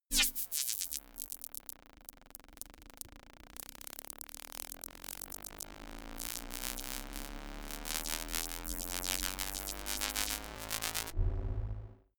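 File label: organic glitch riser, Science Fiction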